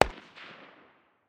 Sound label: ak 762 far distance; tacz-tweak-pack; taczmechanics